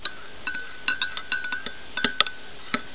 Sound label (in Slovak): Miesanie kavy; Domacnost; Revelation Sight and Sound SK